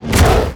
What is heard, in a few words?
Whitemane Attack